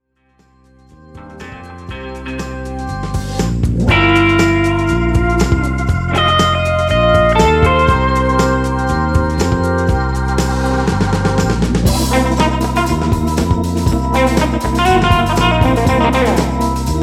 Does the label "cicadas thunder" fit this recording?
No